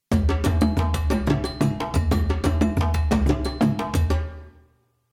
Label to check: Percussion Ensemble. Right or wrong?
Right